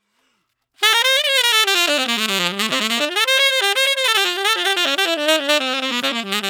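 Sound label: 145 NuJazz Sax A major; Digidesign; Pro Tools; Pro Tools Essential Loops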